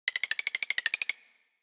Tiptoe, Classic SFX, cartoon; musicradar; cartoon caper

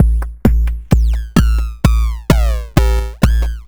Crushed Beat 130BPM; musicradar

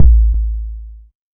808 Kick; Novation DrumstationV1

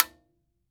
TIMBA, TIMBALES

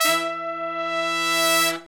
brass longs, Brass Sections, Horns; Roland LCDP06; R&R Horns; R&R Sect.sfz

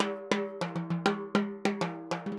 Timbali, Latin Percussion

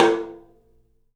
OPEN TOM; 3D Sound; 3D Drums

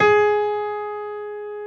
PF ST G#3, Piano; AKAI S6000 CD-ROM - Volume 1

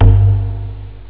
log tom; labrat; jazz jackrabbit 2

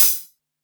Quasimidi 309, Drums

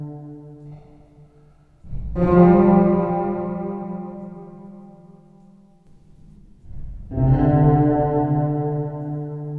SHINN 100 G, Bass Grooves; USB Soundscan vol.30; AKAI

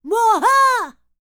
Vocal Planet World Voices, NOMADIC; ILIO; Vocal Planet VOL-5

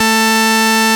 FARFISA, ORGAN; Vintage; Sonic Reality; Vintage Timetraveler

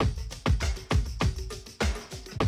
drum loop, 100 BPM

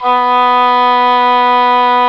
B3 OBOE; ORCH.COMP

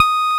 Roxichord, E.Piano

